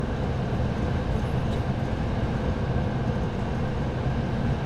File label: old italian diesel train interior moving loop